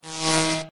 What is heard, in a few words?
airlock electrify on; Machines